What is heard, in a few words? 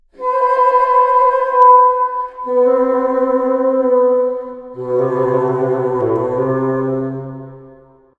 bassoon; music; wind